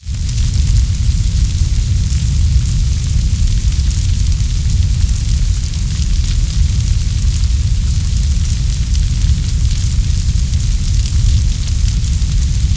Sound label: Fire burn Forest Fire Artificial Full